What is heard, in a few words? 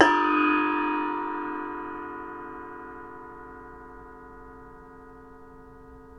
NEPAL CYM, Asian Cymbals